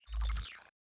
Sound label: digital whoosh